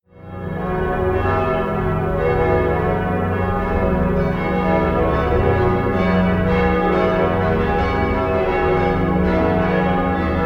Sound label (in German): frankfurt stadtgelaeut, glockenlaeuten